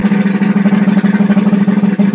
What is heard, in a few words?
drum roll professional